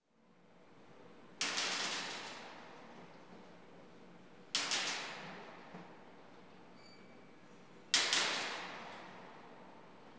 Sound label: spring loaded drying rack